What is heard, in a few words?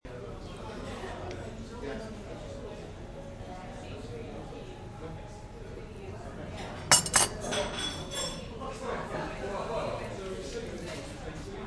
cafe aroma sounds